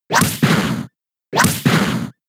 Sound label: Tail Slap 2 hits; pokemon sfx gen 7 attack moves sumo usum